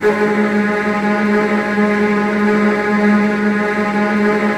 Virtuoso Strings, TRM FX

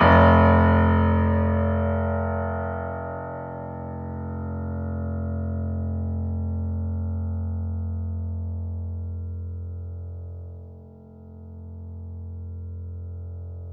Mf F 2 off, Grand Piano off mic

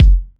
Kick, Kickdrum; Equipped Music; Loungin House

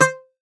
Greek Bazouki, Plucked Strings

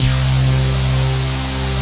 TECHNA STABS, TECHNO; Pro-Rec - Synthsonic; Z1 TECHNO

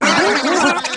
vox; voxpodge up; E-MU Producer Series Vol. 8 – Platinum Phatt; MoPhattPerc&Kits

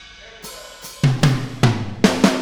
Intro, Full Mix, Drums; Sonic Foundry (Sony Creative Software); Drums from the Big Room The Mixes; Demo Projects

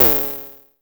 TECHNO PERCS; Crypton - DrumBase5000

